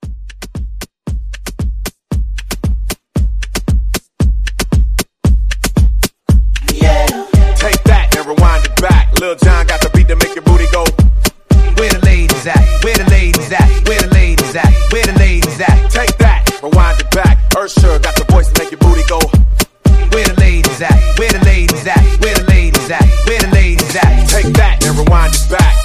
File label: Quick Party Break Clean